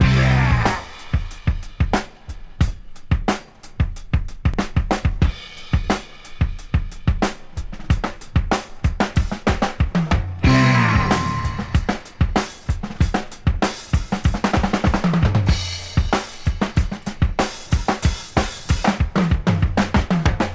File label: 93 bpm breakbeat c key